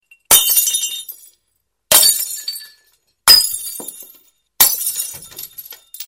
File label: Glass Break